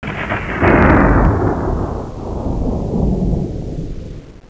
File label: Thunder